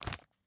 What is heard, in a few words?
paper grab